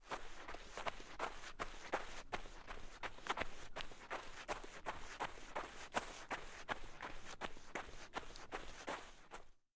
Run Beach; LOOPERS

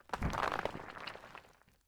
Collapse; LOOPERS

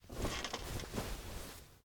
Go To Bed; LOOPERS

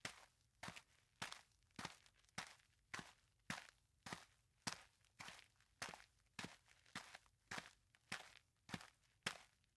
Footsteps; LOOPERS; Tyler

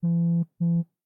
Receive A Message; LOOPERS

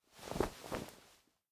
Rustling Of Clothes; LOOPERS

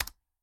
Press The Enter Key; LOOPERS

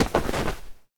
Impact; LOOPERS

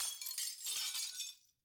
Break; LOOPERS

